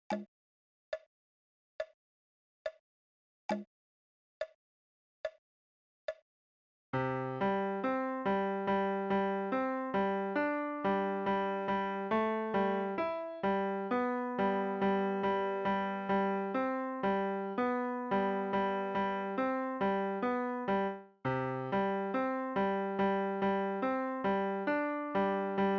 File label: Prelude left